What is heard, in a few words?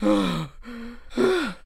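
male heavy sighs after run; muzhskie tyazhelie vzdohi posle probezhki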